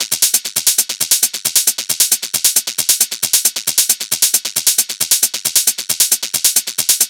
Hat Loop 135, Techno

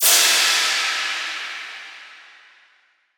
Crash; Cymbals; Ghosthack; Color Bass Essentials

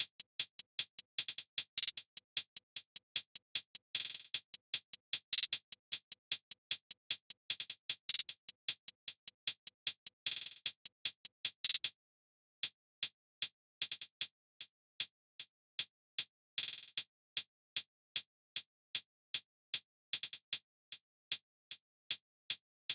Hihat Loop - 76 BPM; Cymatics; Orchid Sample Pack

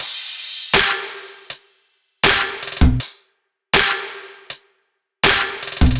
Top Drum Loop 160 BPM; Cymatics; Light Free Sample Pack; Bonus Samples

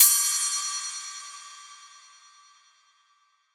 Ride; Cymbals; Ghosthack; Color Bass Essentials

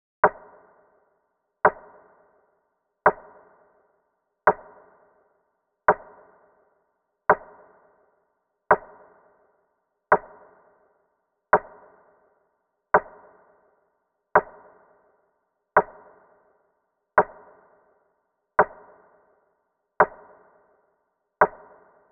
Drum Loop - 85 BPM Snare; Loop Stems; Cymatics; DOPE DRUMS VOL. 1; Find Drum Loop

